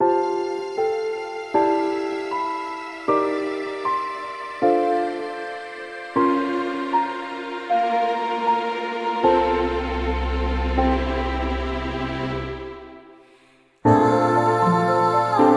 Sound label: Bgm dungeon; forest of whispers